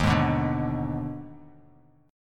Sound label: Eb Major 7th Suspended 4th Sharp 5th down Bass Standard; strummed; EbM7sus4#5